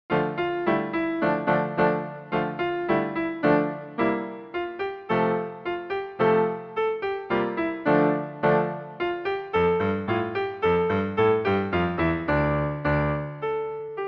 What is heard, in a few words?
Children s Game; libri di pianoforte per i corsi di base